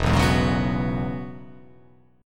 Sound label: E 6th Add 9th E down